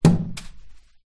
leisure juggling bean bag small hit single pane window; zapsplat